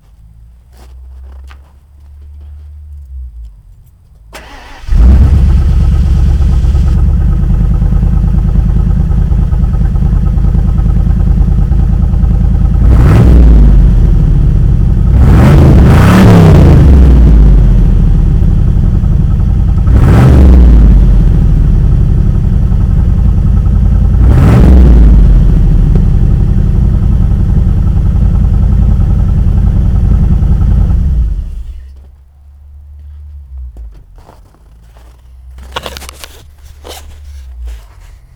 Start up + Rev, Ford Bronco; 1986; Bronco86

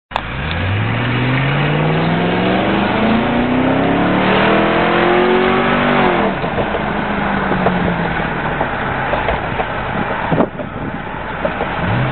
window down

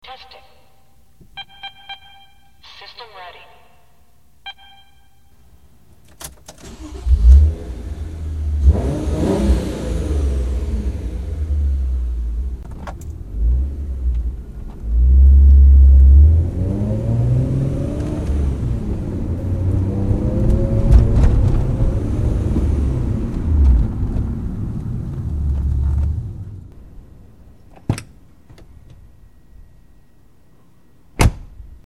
96 ford probe gt dynomax exhaust

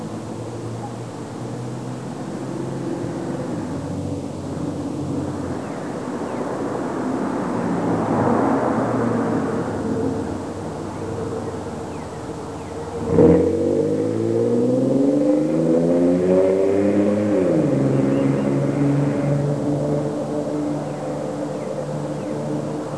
take-off

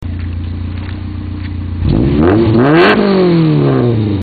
skunk2, Ford Bronco Integra exhausts sound

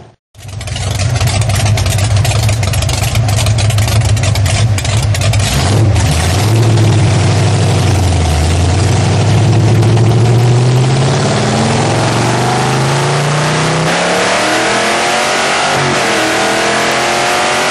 sick oldschool v8 car

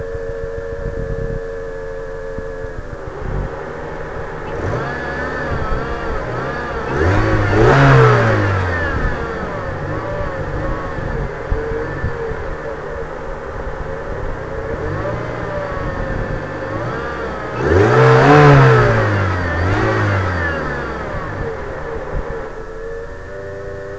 engine sound

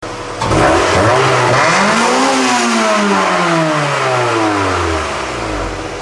JDM Type R, exhausts sound

